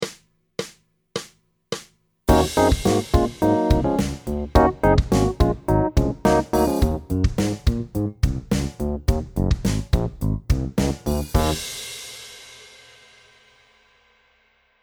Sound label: rock example, piano